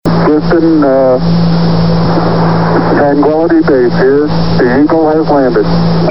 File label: Space Landing; NASA; Touchdown (short version)